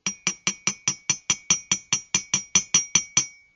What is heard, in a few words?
Wide mug with hot water